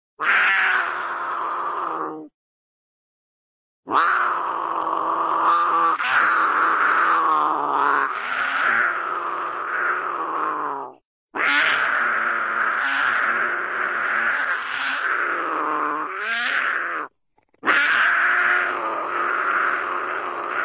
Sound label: cougar snarl, animal, cat; cartoon